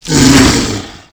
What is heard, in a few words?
shout; silent hill